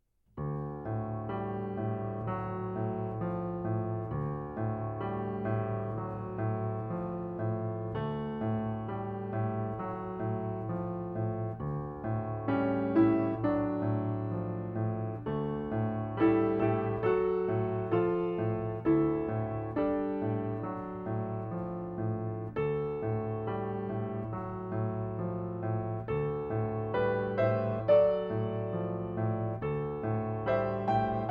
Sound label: Piano Piece